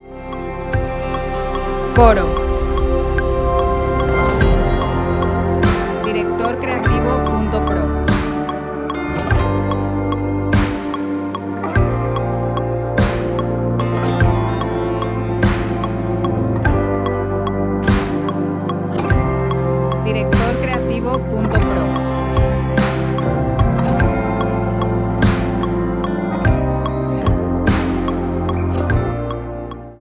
Lead, 6 8

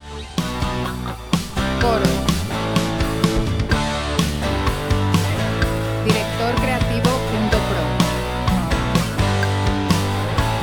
126bpm 4/4 D

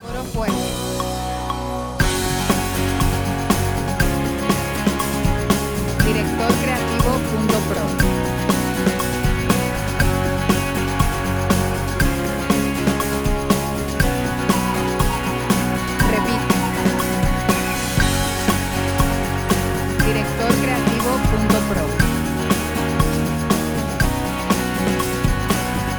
120bpm E

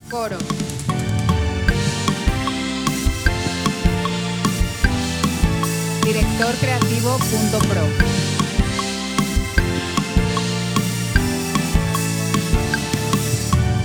152bpm Dm

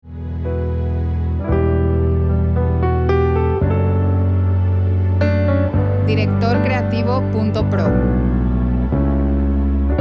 126bpm 4/4 D

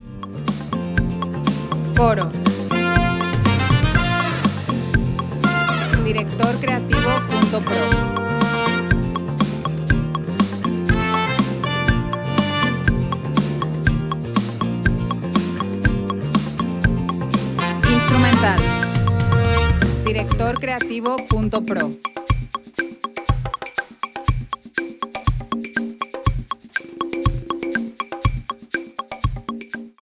121bpm 4 4 Bb

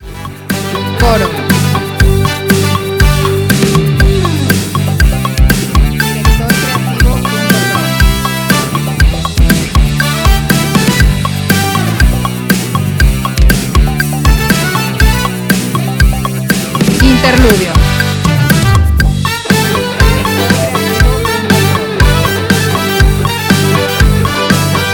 129Bpm 4/4 G

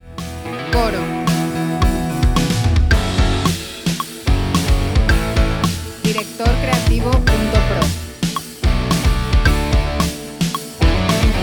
110Bpm 4/4 A

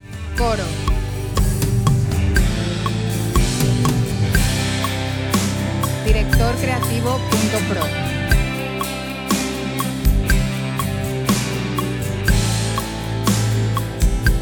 121Bpm 4/4 C

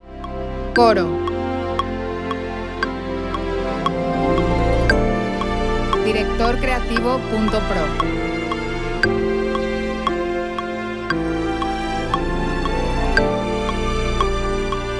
47BPM 4/4 F

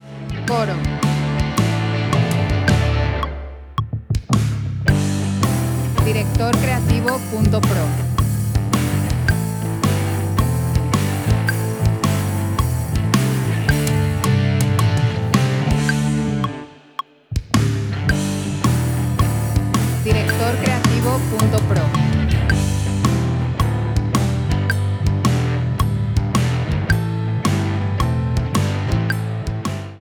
109bpm 4/4 G

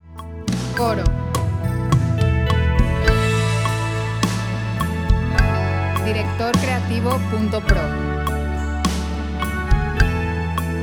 104bpm 4/4 A

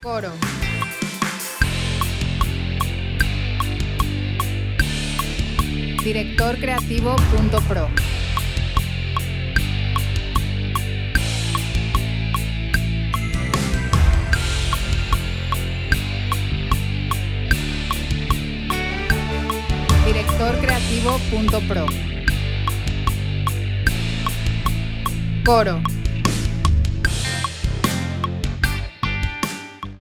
151bpm 4/4 Am

